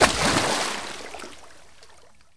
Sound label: sml splash; ambient; Shadow Man